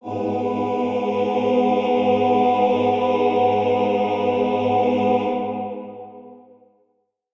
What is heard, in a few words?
Kuva Lich Requiem Mod Choir H